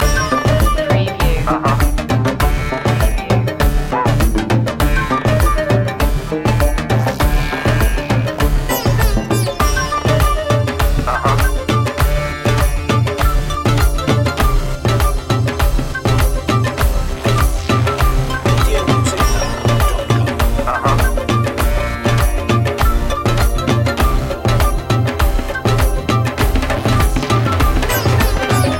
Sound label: funky india loop